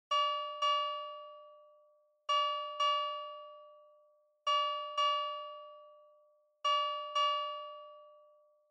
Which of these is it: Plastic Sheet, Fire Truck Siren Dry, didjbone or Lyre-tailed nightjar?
Fire Truck Siren Dry